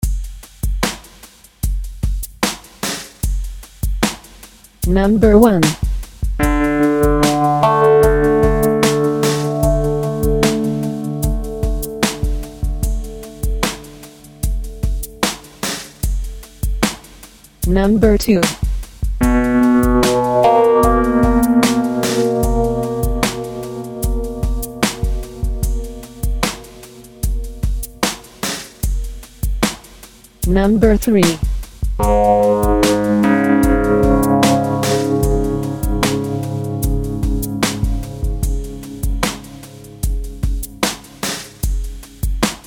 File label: intervals maj up; 8up no drone